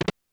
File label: chest open